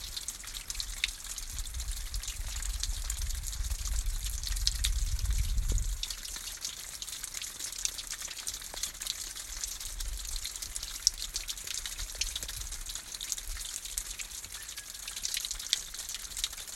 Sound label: dripping water